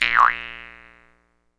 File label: Jaw Harps